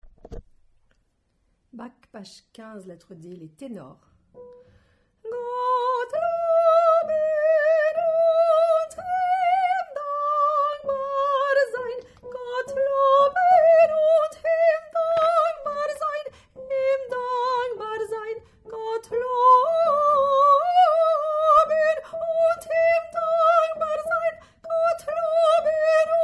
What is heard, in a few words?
Tenor